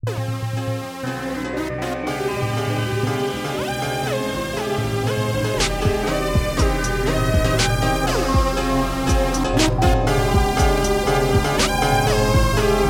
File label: beat